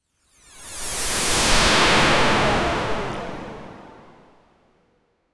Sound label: digital